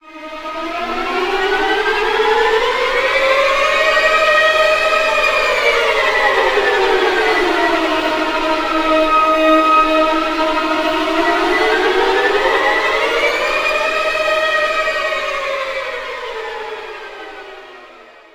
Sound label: Strings; Forest